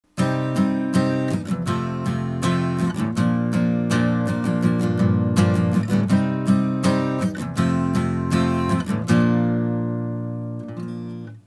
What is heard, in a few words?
maj prog; fretjam